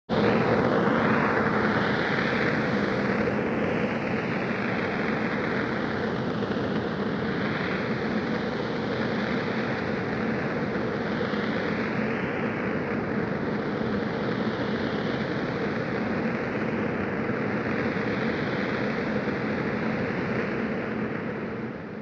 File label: Retro Anime Jet Close